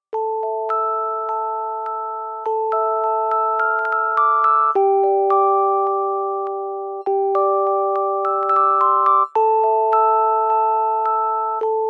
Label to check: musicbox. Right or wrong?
Right